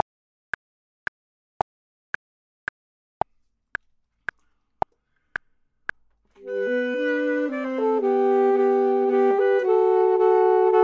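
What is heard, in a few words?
both, Saxophone and Clarinet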